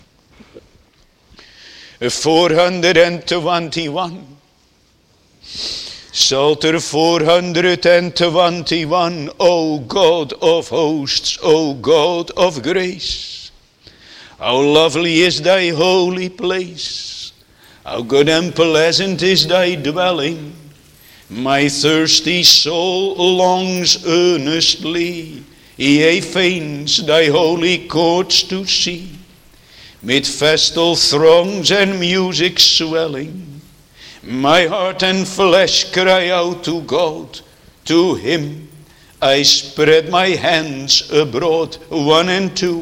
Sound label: Singing